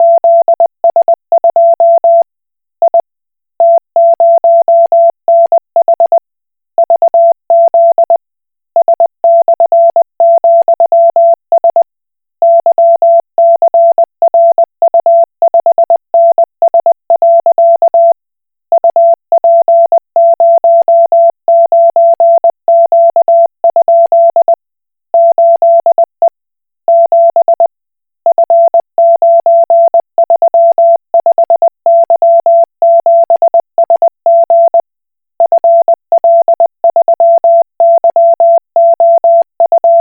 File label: Koch Morse